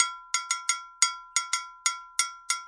179 bpm small cowbellish, drum loop

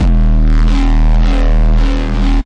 desecrated bass hit, Evil Bass Sounds; Desecrated Productions

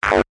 fuzzbass

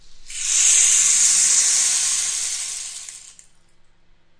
rainstick stick; ETHNIC and WORLD PERCUSSION

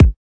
kick

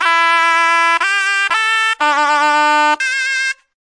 reedy instrument melody; WORLD

